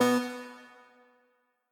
Quasar Move B; Mixolumia

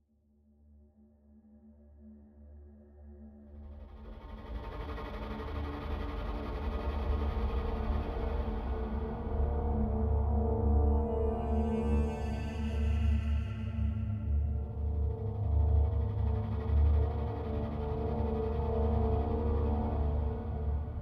String

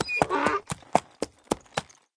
Burro Knock Hoofs

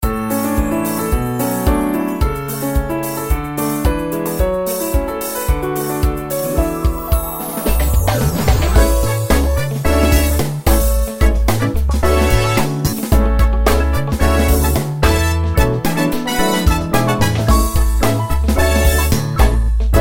Level Music; Family Restaurant

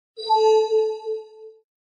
Wizard Cast; Mighty Knight 2

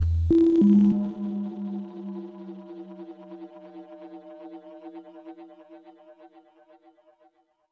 Overlay Tone

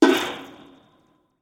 rope break

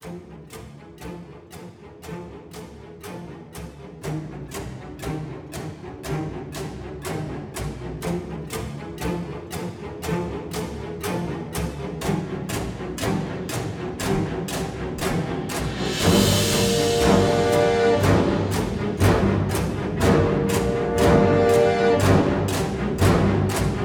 cinematic strings suspense

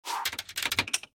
Key select; Troll Face Quest Unlucky; Android

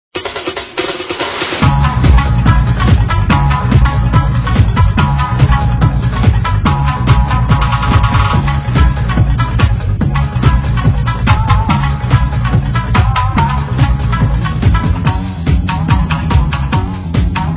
Level Completed; Run Ninja Run

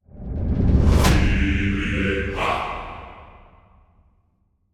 Unlock Dust Level; Towaga; Android

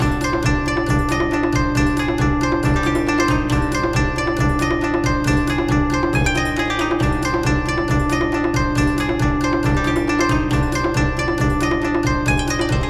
Japanese Ethnic Intense; Lost Ruins

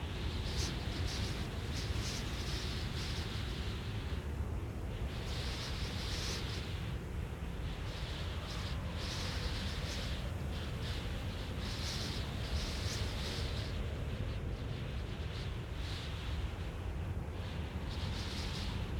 time travel wind loop

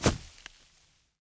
foliage land

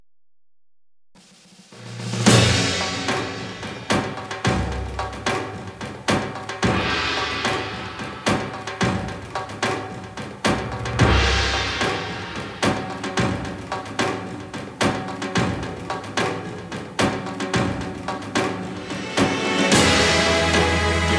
Action

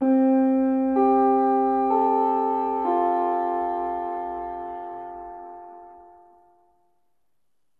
CHIME OUT; School Days LxH; PS2

